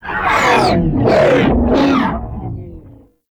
Sentient Ship Attack Maneuver, space fighter; Warframe; RailJack; Enemy Ships; Coil Agent

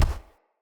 Step Default, Footsteps; Enemy; Corrupted Sproutfolk

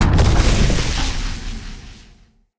Ammo Crate Destroy

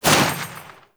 Shot; Warframe; DS Anniversary; Furis Vekesk

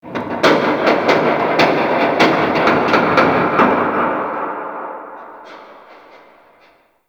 Shipyards Distant Metal Groan, Ambience; Warframe; Grineer Shipyards

